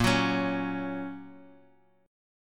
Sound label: A sharp Minor Sharp 5th, down, Guitar, Open G